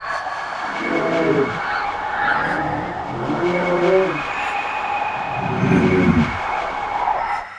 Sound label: near win